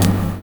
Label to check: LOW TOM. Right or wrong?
Right